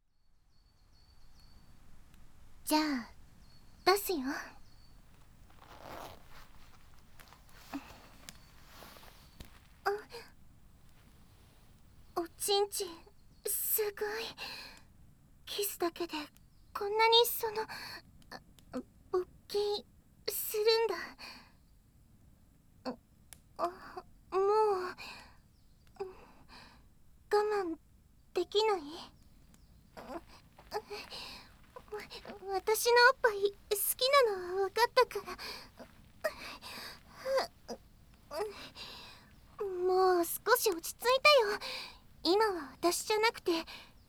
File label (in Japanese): Camp 耳舐めシコシコ野外エッチ, ASMR